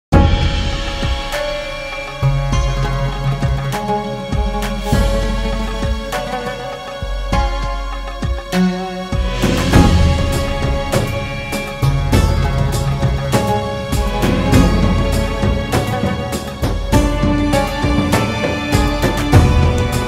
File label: Action Suspense Arabian loop